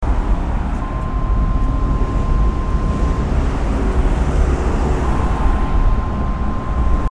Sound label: traffic